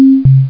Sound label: ROULSTOP; BOXCARS